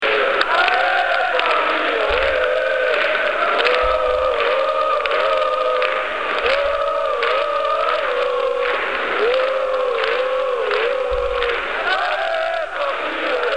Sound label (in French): allez paris allez oh, chants; football; psg